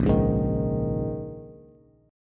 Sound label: Bb Minor 11th Bb down Bass Bass Standard; strummed; JGuitar